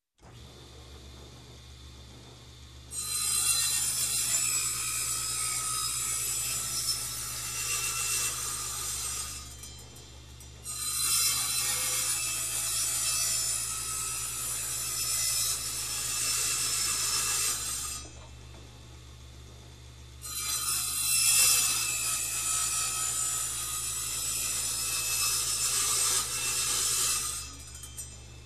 Cutting Steel Using Electric Hand Cutter

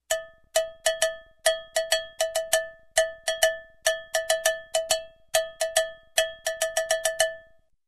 Cowbell Rhythm

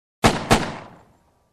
Rifle shot twice sound

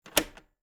Cassette player button click

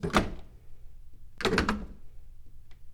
Pay Phone Pick Up Hang Up

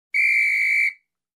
Traffic Officer blowing whistle

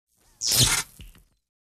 Electric Shock

